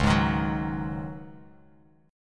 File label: C sharp Suspended 2nd Suspended 4th, C sharp, Bass Standard; strummed; JGuitar